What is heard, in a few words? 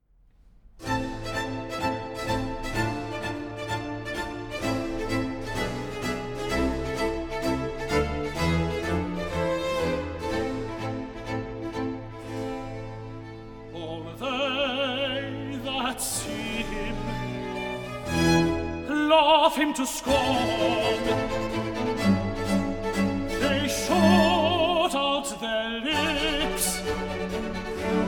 recit accomp all they that see him